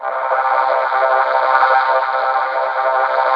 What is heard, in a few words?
VOC VOX C2, VOCODER; Zero-G Ambient 1